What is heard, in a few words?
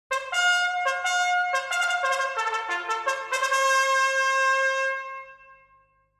piercing trumpet call